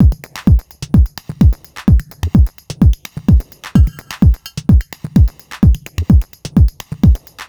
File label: drum; whisper